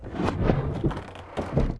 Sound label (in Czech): zebrik; Mafie 1